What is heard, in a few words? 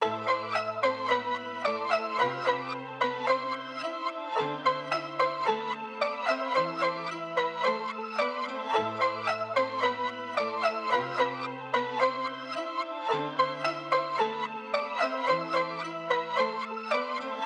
Melody Loop Amin 110bpm